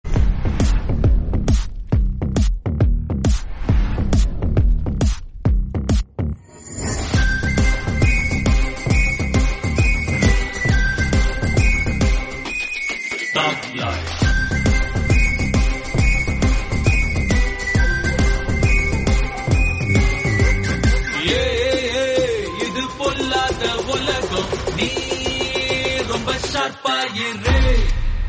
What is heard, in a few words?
sister love bgm; maaran